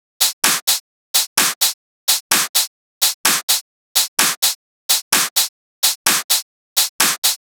Top Loop 35 128

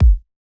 123bpm Kick; Love My House Kit 2

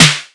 snare; overwatch

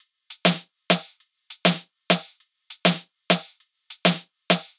100 top loop soft percussion

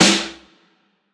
snare shoe

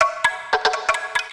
PERC jungle

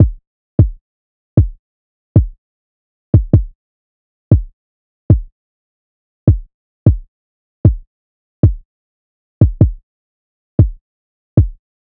153bpm Kick

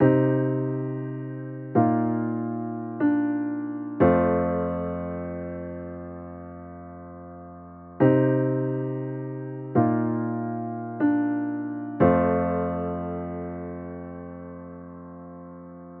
Music Loop Dry 120 Cm

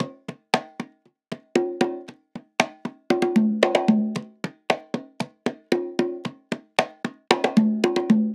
115 Congas Loop

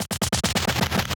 Glitched Shot

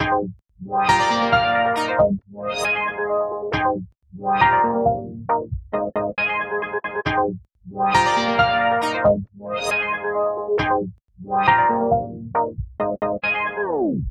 Arp G-min Dry

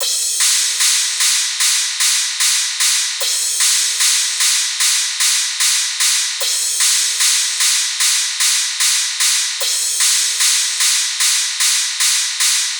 Drum 150 top

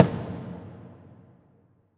Box Kick

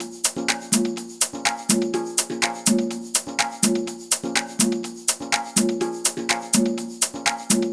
DEEP VIBES TOP LOOP 124BPM

